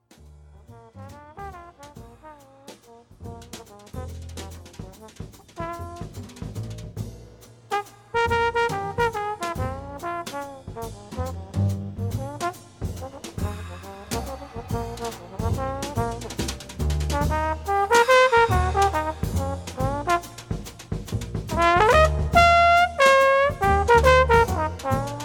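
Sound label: alto trombone solo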